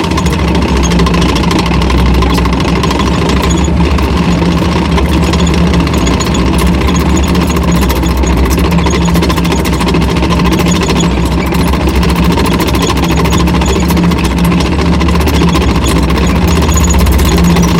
tracks light speed, tanks; engines; War Thunder

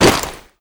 foley gear land

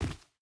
footstep burlap dirt jump land; Rust

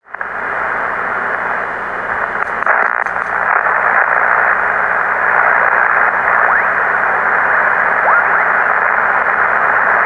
radio static

